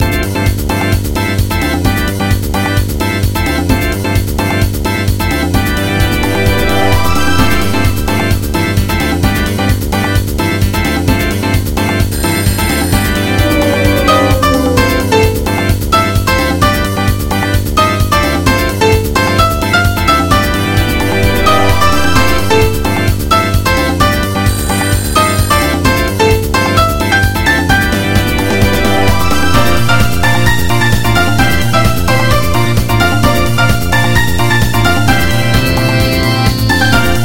FL Studio Trance Remix

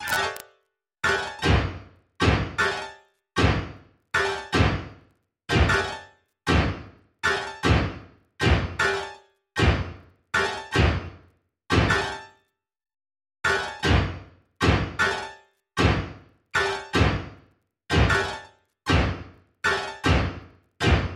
Drums KICK SNARE

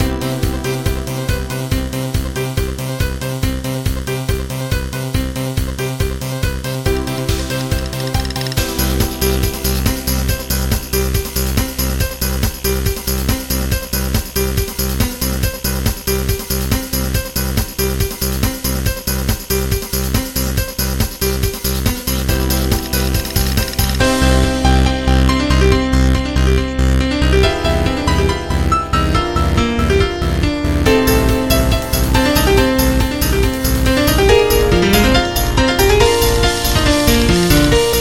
Trance

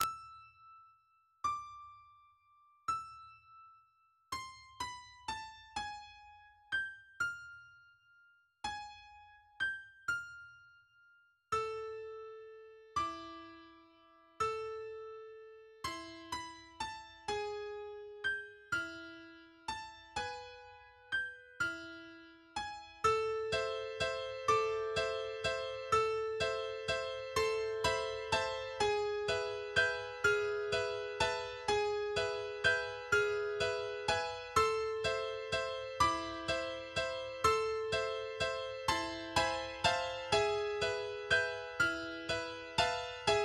Music Box

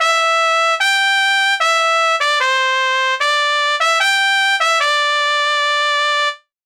Trumpet Player

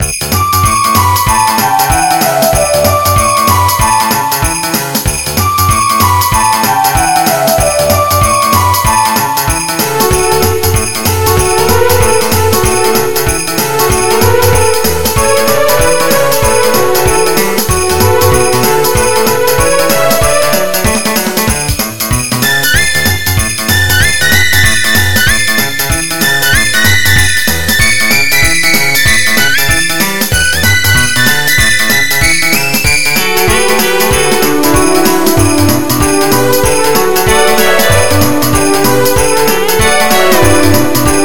Frosty Village Elektro Dance RmX

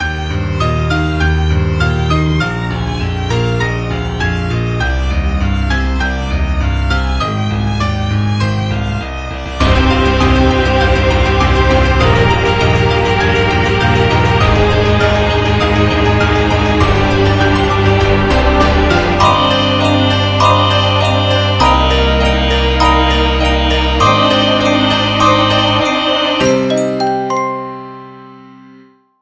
Puppet Show Background Music

